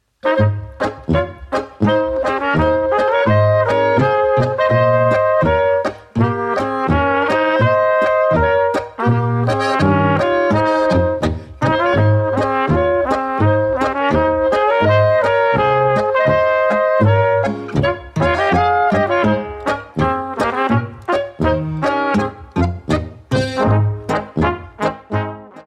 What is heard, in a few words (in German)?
boarischer